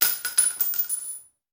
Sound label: Bullet Shell; Sound Ideas; Guns